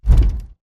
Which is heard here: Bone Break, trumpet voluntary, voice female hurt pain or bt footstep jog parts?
bt footstep jog parts